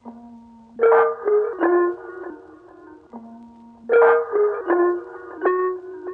Dark Melody Loop - 156 BPM D# Min Plucks; Loop Stems; Cymatics; 2020 Melody Collection

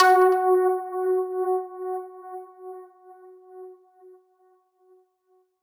Riddim Synth Hit F# Tension; One Shots; Ghosthack; Riddim Essentials 2020

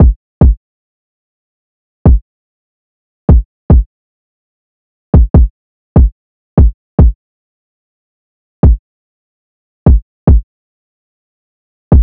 Drum Loop - 146 BPM Kick; Drum Loops; Loop Stems; Cymatics; Mystery Pack Vol 4; Tighten Drum Loop

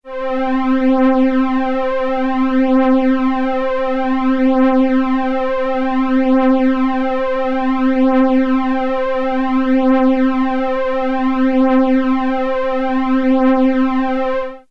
Prophet 5 PAD Mellow - C; One Shots; Cymatics; Fantasy Synth Sample Pack

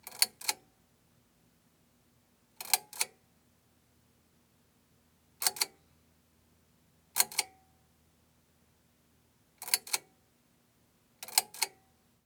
Closet Light Switch On String; Fairlight Sound Lib

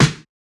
Snare, D#; Drums; One Shots; Humble Theory Snare; Cymatics - Humble Hip Hop Sample Pack

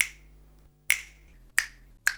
finger snips; Cartoon - film effects

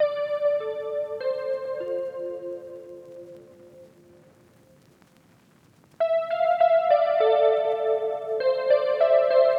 R&B Melody Loop - 100 BPM D Min E Piano; Melodies; Loop Stems; Cymatics; Oracle Sample Pack

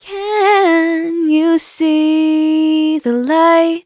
E Major, Vocals Phrases; GhostHack; Can You See The Light

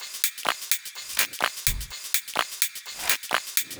Top Loop - 126 BPM F, Drum Loops; House; Guest List; Cymatics

